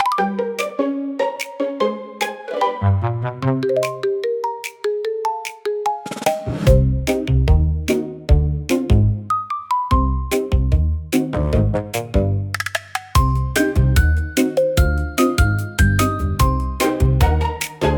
Marimba Parade, comical marimba for funny scenes

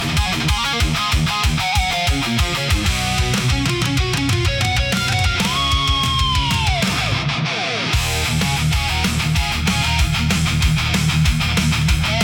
hard rock for powerful

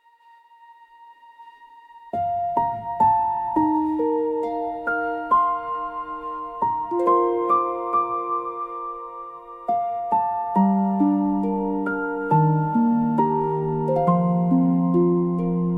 fantasy music nature forest

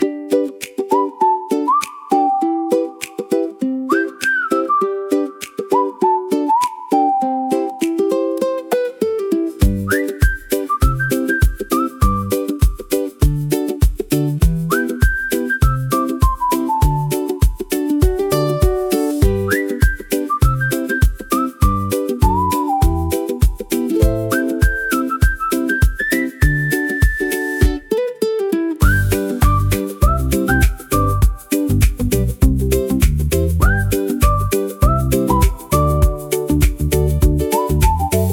ukulele tropical for bright and light

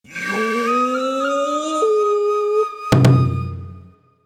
和風, japanese ghost jingles

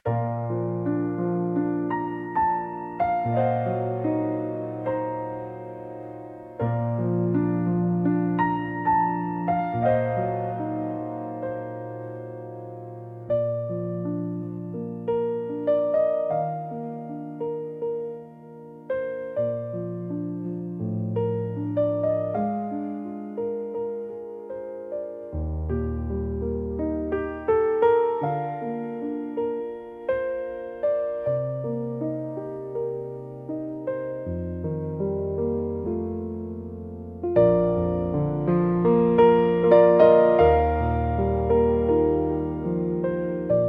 fantasy music nature forest; ghibli style; wind healing nostalgia

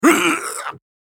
"Ugh", samurai voice; samurai voice selection 2

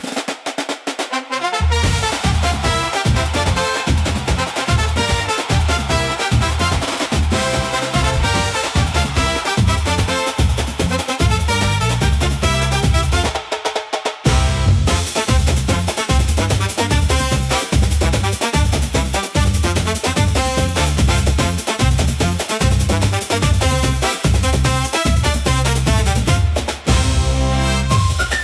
Parade of Brass, upbeat carnival; for festivals and parades

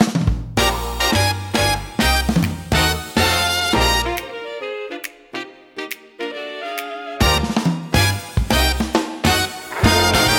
Brass and Bubblegum, electro swing pop

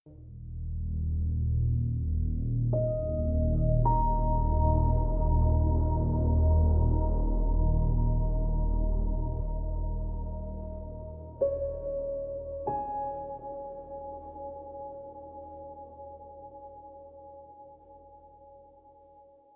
psychological dark ambient quiet restless

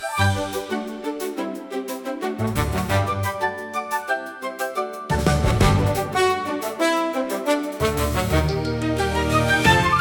fantasy music cute bright

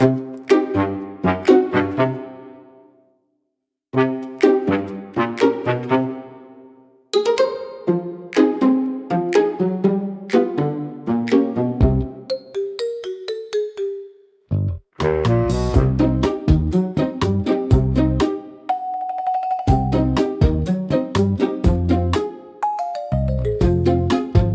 Marimba Muddle, comical marimba for funny scenes

